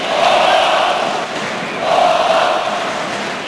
Chant Away, Crowd; David Beckham Soccer; XBOX

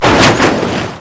CRASH; Totaled; XBOX

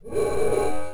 alarm; Soldier Of Fortune II Double Helix; XBOX; ambience

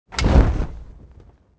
chute open; Soldier Of Fortune II Double Helix; XBOX

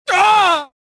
pain; jedi

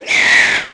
Arachnid Attack, Aliens

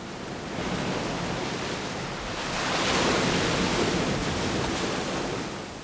ocean; Ambient; MX vs ATV Unleashed; XBOX

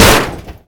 Sweetner Metal, impacts; Totaled; XBOX

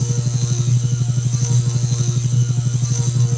Crate Lifter Beam, machines mechanical

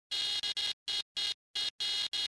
fuelpump; Star Wars Jedi Knight II Jedi Outcast; XBOX

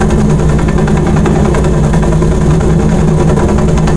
huey interior, ambience; Soldier Of Fortune II Double Helix; XBOX

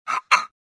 jump; Star Wars Jedi Knight II Jedi Outcast; XBOX; shadow

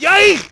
yike, negative; Splashdown; XBOX

